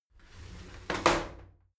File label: shower slide doors open soft; objekte; tueren